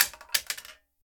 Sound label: Drop Saw; Prison Architect